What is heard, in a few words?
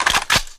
pick weapon